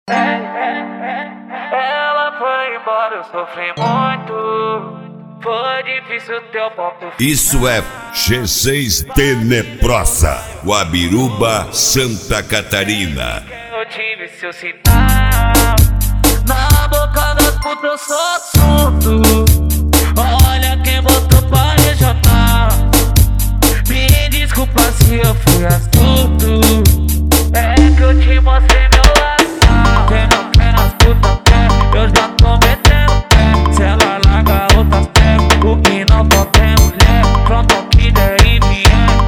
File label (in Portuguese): sertanejo automotivo